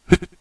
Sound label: jump